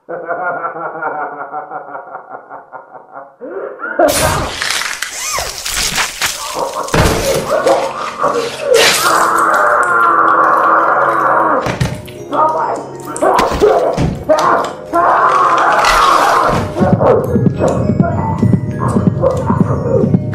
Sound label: springlock failure